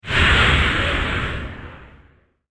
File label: Distorted Impact; impact sound effect